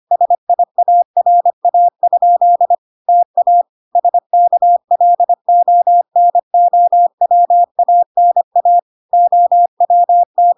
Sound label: 25wpm